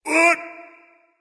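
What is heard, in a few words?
nonverbal surprise; smite; voicepack; ullr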